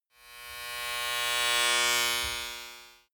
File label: servo small whoosh